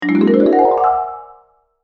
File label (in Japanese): マリンバグリッサンド